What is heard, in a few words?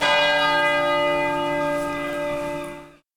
bell